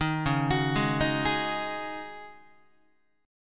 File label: C sharp Suspended 2nd Suspended 4th, C sharp, Guitar; arpeggiated; JGuitar